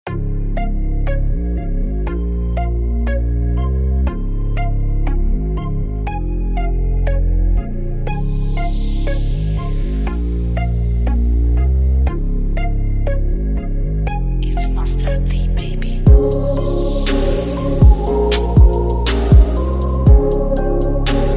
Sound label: Beat